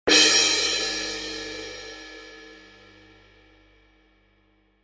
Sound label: K Constantinople Suspended, cymbal